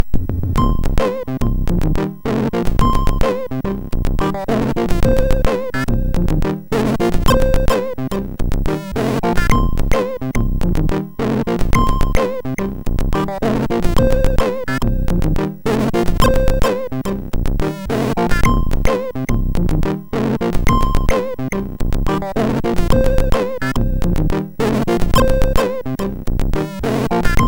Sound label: tune, MOS6581R2